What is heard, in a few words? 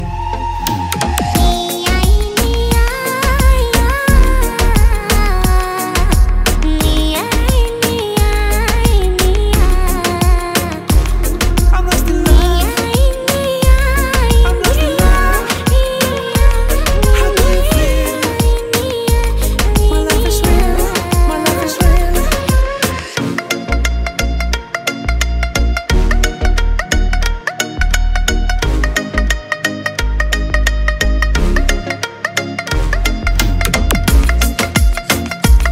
English Ringtone